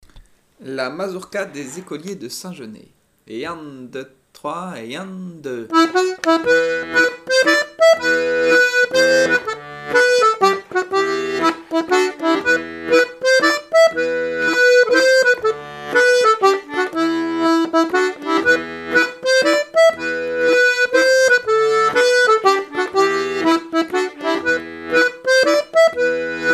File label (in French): mazurka